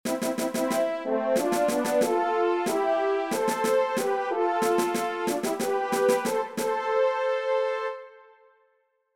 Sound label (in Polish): fanfary